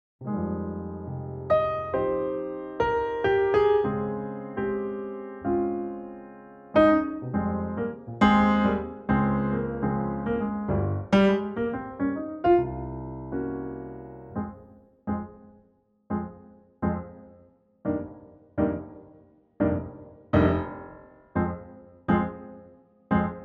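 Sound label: F minor Piano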